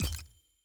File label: big click; profile summoner